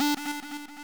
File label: sub lead d3